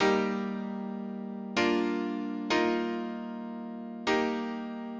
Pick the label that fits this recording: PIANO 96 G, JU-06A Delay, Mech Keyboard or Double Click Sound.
PIANO 96 G